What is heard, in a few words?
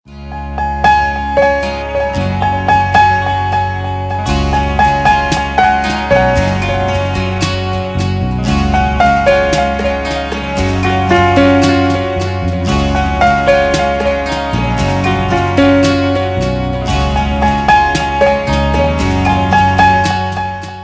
Piano Song